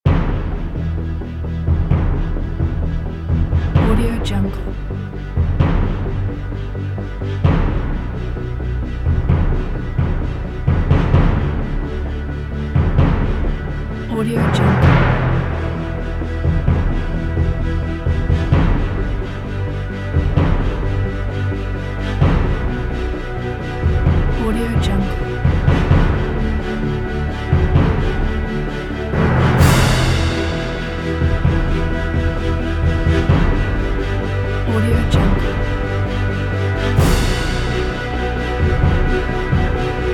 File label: epic massive heroic soundtrack